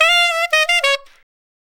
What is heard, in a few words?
072 W-Coast HipHop Sax C#